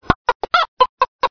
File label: chicken